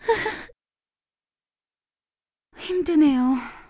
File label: Lose Point; Shua